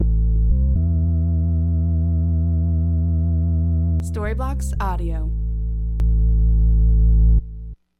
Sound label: Ambient House Organ 120BPM A